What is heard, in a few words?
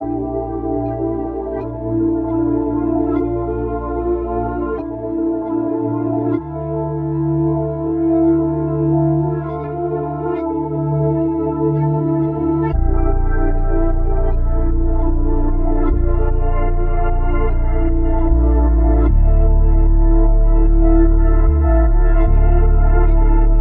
151 BPM D Min, Melodies; Alone Forever; Cymatics; Immortal Production Suite Beta